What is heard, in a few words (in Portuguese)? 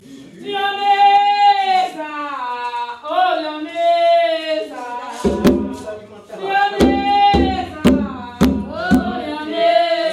terreiro de xango